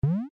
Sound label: Jump; grammar games